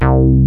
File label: OP6 RESTRANS, ARP, OLD SYNTHS; Rubber Chicken Software Sample Bank